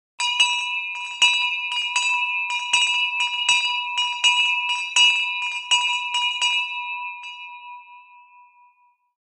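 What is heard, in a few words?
Hand Bell Ringing Muffled; bells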